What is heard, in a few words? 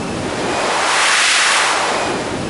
Swoosh